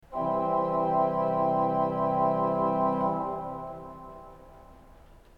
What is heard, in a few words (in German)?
ton, orgel